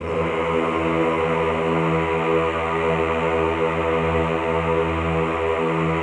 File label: EHH E1, Classical Choir; Best Service; Extended Classical Choir; EHH LO+HI LL